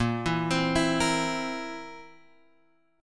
Bb Suspended 2nd Flat 5th Bb down Guitar Open G

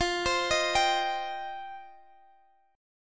F 7th Suspended 2nd F; x,x,x,x,17,19,17,16

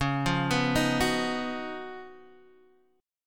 C sharp 11th C sharp, Guitar, Open G

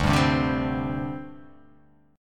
D 7th Suspended 4th D

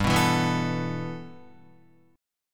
G 6th Add 9th G Guitar, chord; G6add9 strummed

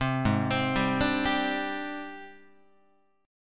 G sharp Major Sharp 11th, Guitar; G#M#11 arpeggiated; 3,4,3,5,6,6; JGuitar

